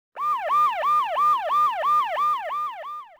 siren; Soldier Of Fortune II Double Helix; XBOX

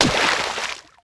jump Land water; characters

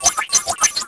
new lap; Quantum Redshift

